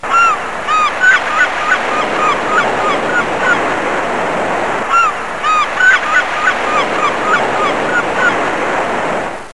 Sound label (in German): moevengeschrei